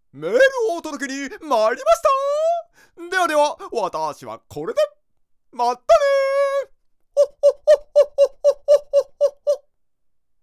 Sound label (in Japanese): メール音